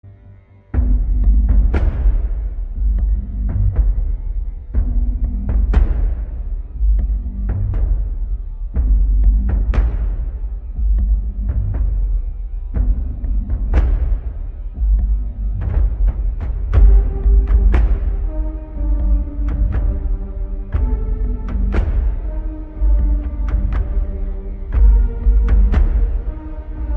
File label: Bass Boosted